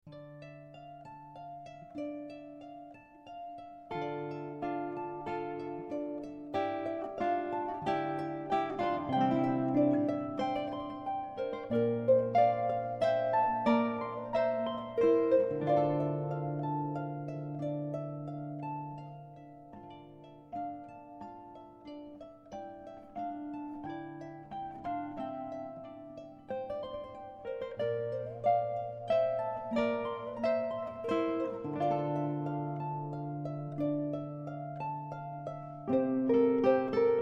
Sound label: Oriental